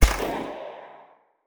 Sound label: shoot lightning; Learn to Code From Zero With Godot; spells